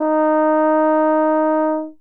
Horns

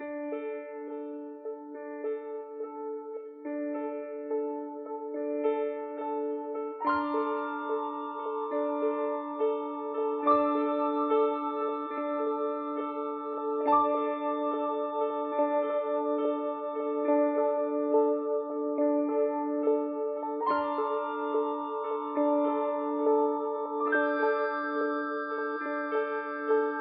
piano and keys, Sad Loops

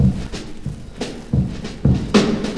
cliche jazz, Drum loops

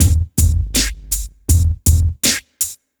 Drum loops, Electro

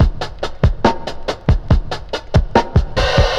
woodblock head; Drum loops

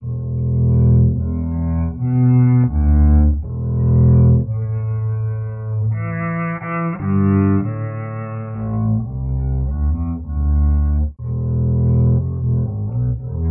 strings bass violin noodling, sad; Loops

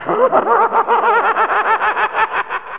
laugh evil

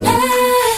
"ah", SUNG; ooh ahh

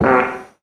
fart short

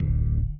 ober, Bass; Notes

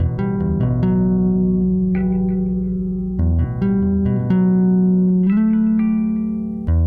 guitar, Sad

